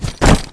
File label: phantom child die; classicro